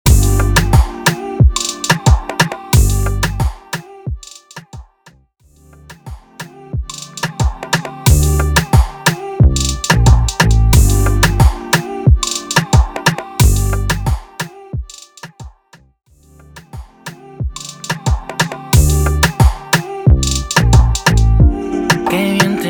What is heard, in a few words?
Intro Outro Dirty 90 BPM Urban Pop Raico